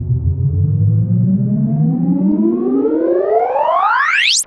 Uplifter

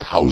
Vocoder Oneshots; Vengeance Samples

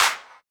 Clap; Vengeance Samples; VEC1 Claps

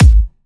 128BPM Kick; Vengeance Samples; Timebomb Kit

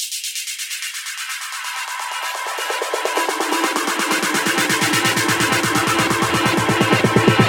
VFX2 Loop 128 BPM

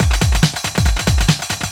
Loops BB 140, BreakBeats; Vengeance Samples

